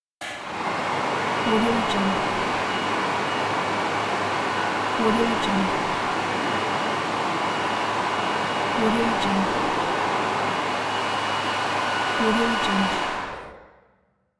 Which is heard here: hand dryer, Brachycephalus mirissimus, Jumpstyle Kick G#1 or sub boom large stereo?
hand dryer